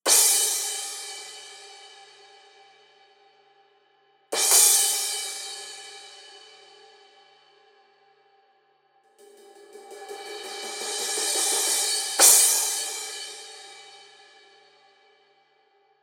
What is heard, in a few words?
Crash 17; Iris